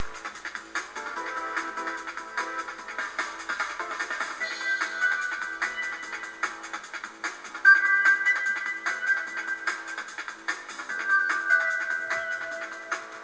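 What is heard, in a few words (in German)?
musik zimmerlautstärke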